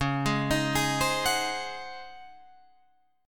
C sharp Major 7th Suspended 2nd Suspended 4th C sharp, Guitar Drop D